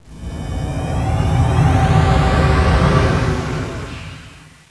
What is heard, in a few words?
cloak engaged; armada2